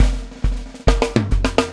PEAK, Backbeat

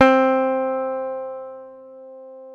C 3 JAZZ GTR; Univers Sons; Jazzistic

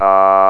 AAA, ELEC VOX; East West - Global Sounds

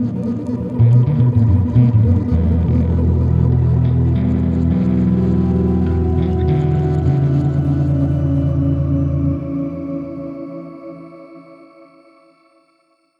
Processed Electric Bass

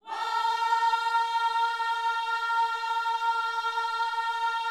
WHOA A#4, gospel; Spectrasonics Vocal Planet

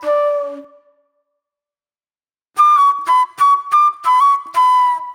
Mellow Flute

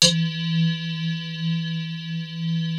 ICE SYNTH E2; Greytsounds VOL-1; VFX

